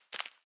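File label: footstep concrete; The Swapper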